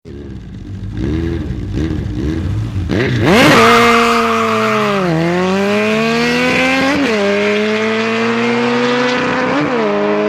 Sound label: Motorbikes Road Race, Two bikes rev and race away from close shot; Motorcycle